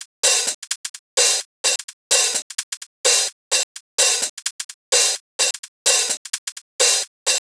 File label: Hihat Loop - 128 BPM; Drums; Loops; Top; House